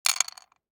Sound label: DICE on Wooden Tray, Throw and Roll, Mini, 6 Six Dice; Samples; ArticulatedSounds; DICE Sfx Party (Extended)